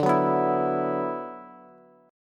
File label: D 6th Add 9th, Bass; strummed; JGuitar